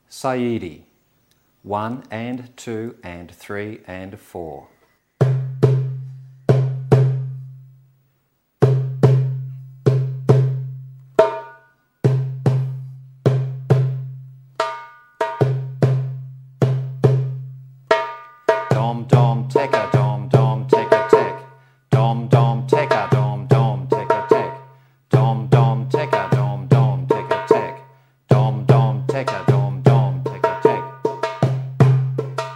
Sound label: Basic Saiidi